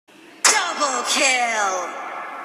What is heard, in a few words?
kill